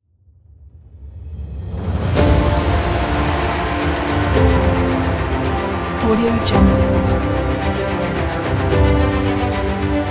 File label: epic